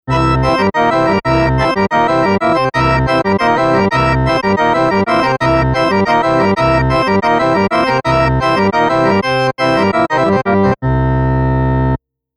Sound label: harmonium